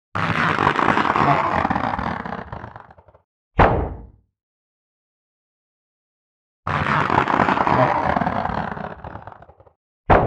Cartoon Rubber Catapult; Mix N Match

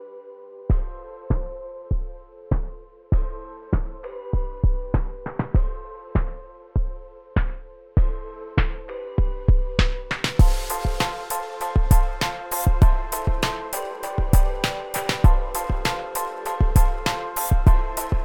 Upbeat Background Music

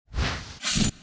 sword

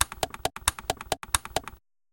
135 Bpm Loop

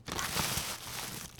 Plastic

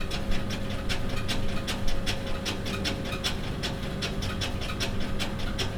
lab machine

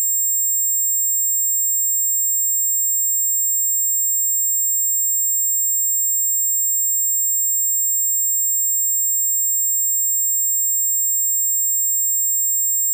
Ringing In Ears Harmonics

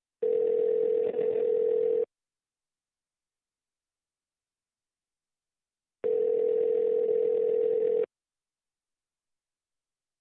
Phone Outgoing Call

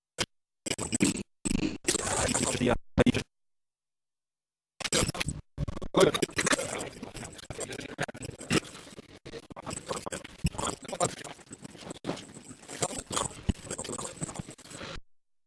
Rewind Fast Forward Of Digibeta Machine

